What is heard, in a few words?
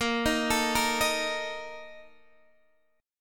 Bb Major 7th Suspended 4th, Guitar, Half Step Down